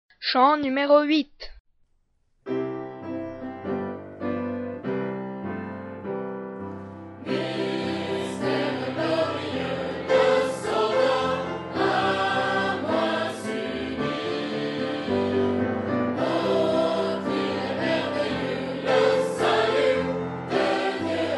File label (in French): Chant; FR